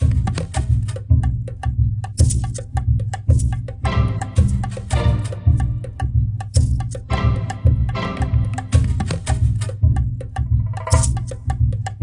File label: Jungle Level